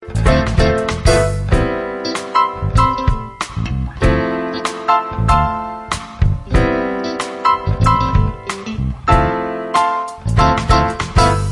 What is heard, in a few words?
Love Chill